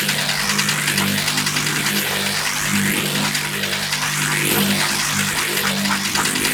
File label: Corpus Ship Energy Rattle Machine Loop; Ambience; Props; Warframe; Corpus Ship Remaster